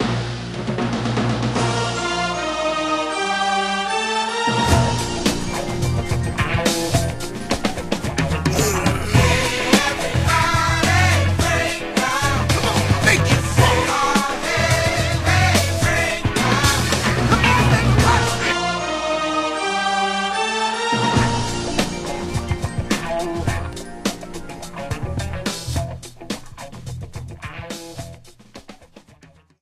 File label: jingle